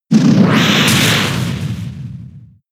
Kick; pokemon sfx gen 6 attack moves xy oras; Mega Kick

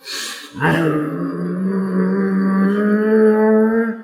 mino charge

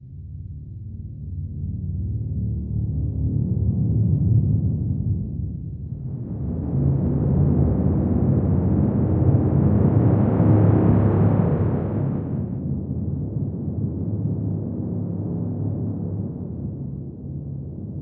Ui Background

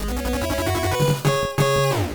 Stage Clear, NES; Stage Clear Part 2

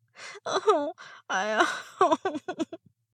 moan

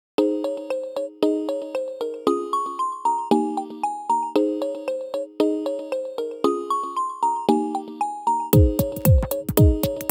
8bit; yume nikki 24 effects; 8bit brain

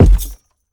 Footsteps Wood Run; The Town

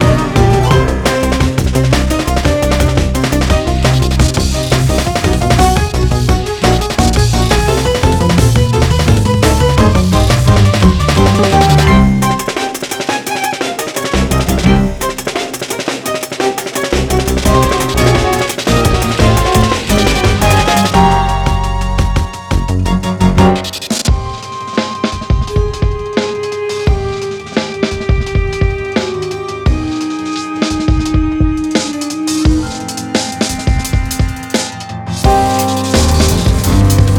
loop 172bpm; chicory a colorful tale; ps4

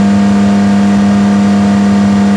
Pagani Huayra High

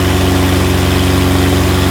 Civic 2020 Low; car simulator 2

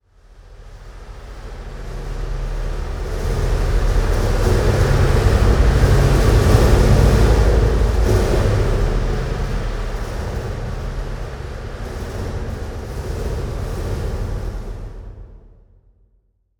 environment temple winds stinger indoors

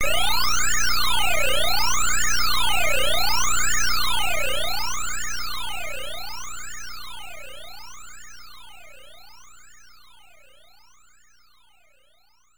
Power Ball Appearing

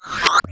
spooky

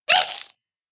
Ice Collision; Angry Birds Space

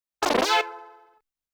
Coin Credit; Namco Classic Collection Vol.2; arcade

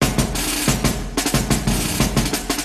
Gameplay Start; bailout wars

